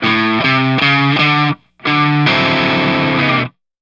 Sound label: Guitar D9, Rock